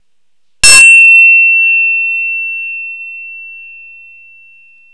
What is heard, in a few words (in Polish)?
Dzwon